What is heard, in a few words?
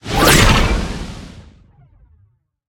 blast; smite; heimdallr